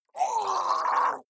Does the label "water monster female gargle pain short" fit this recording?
Yes